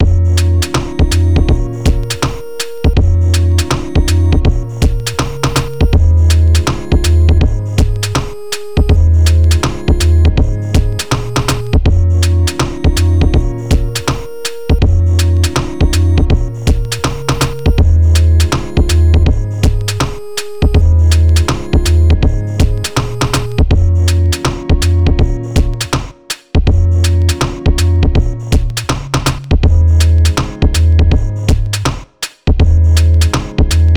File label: Mellow Hiphop